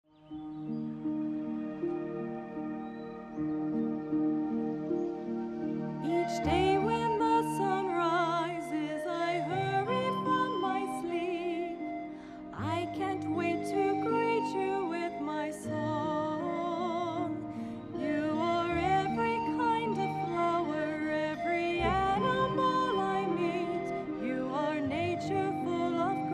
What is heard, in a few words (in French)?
musique serie